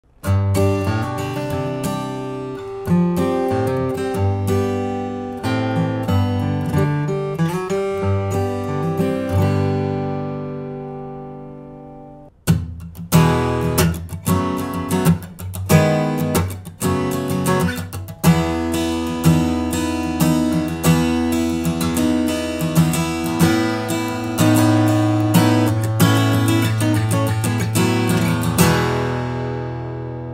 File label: Martin D 35